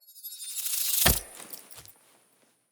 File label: Acorn Catch; Wazard; Bromius; Envoy